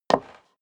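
step high heels wood creak single stair up, footsteps; schritte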